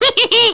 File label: ghost